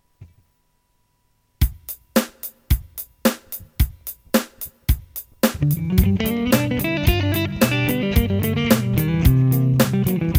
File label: country